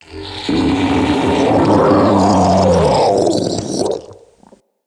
Ancient Shell Death, Infested Barks; Enemies

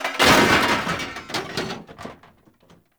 Desktop Metal Destroy; Warframe; Entrati

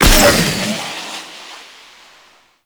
Space Fighter Rocket Fire; Warframe; RailJack; Enemy Ships; Sentient; Coil Agent

